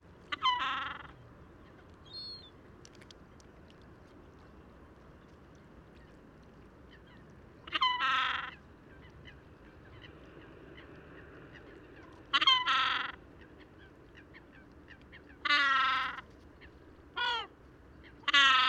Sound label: Yellow Legged Gull Calls Of A Juvenile